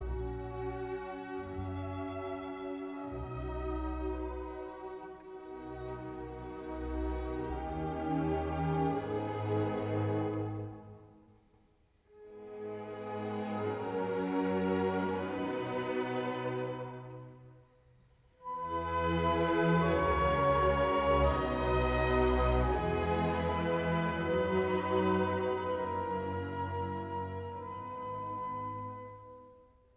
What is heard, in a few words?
piano conc